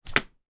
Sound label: foley letter paper drop on table; sound effects one